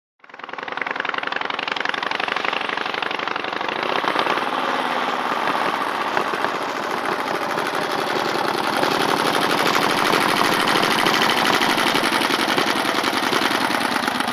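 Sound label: Stop Off Old Motorbike, vintage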